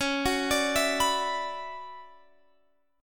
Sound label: D Major 13th C sharp, chord